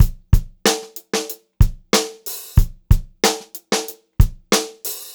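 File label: STRAIGHT BEAT, Funk-Groove Drumloops; USB Soundscan vol.38; AKAI; 90 s Sample CDs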